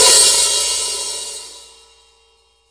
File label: HH OP, Drum Dry; East Collexion; Drum 1 Dry